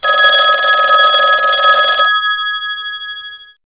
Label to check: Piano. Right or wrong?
Wrong